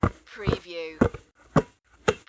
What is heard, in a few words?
Basketball Handling; sound effects bible